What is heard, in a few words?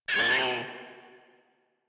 Kavat Vocal Pain; Warframe; Khora